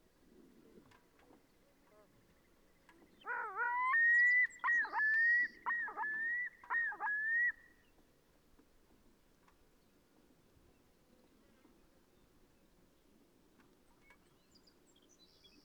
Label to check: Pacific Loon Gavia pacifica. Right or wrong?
Right